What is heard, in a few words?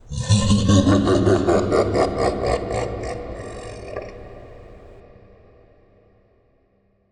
Demon Laugh